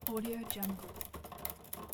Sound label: typewriter key multiple